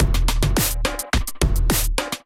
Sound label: Kit groove 106 bpm, Drum; MIDI Clips; Cosine; Ableton; Drum Essentials